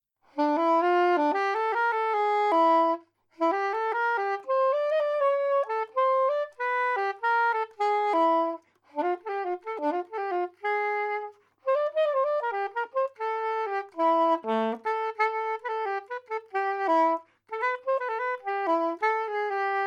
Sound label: soprano, saxophone soprano